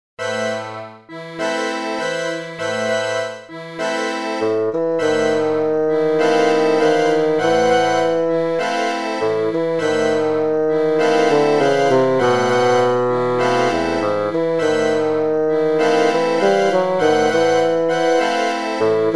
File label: Tango